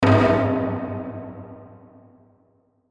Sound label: Hit Drum Low; Hit Drum High Hollow Reverb; Musical; Blastwave